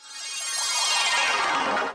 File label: tension sting